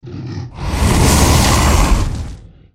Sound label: Dragon Fire